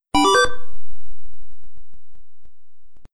Nintendo Entertainment System Famicom Disk System NES Startup; Video Game Consoles Startup